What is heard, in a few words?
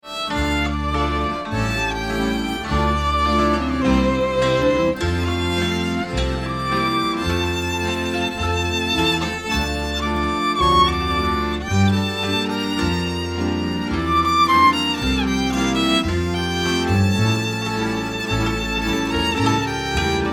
Hungarian Love Song